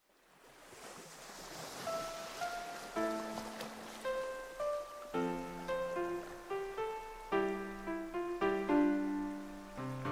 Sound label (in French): wimereux plage